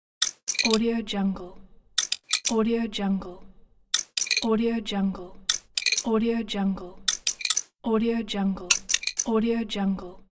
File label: safe combination lock